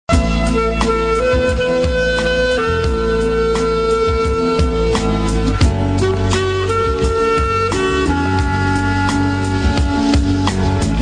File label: FLUTE RINGTONE